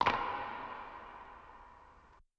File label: Weapon Racket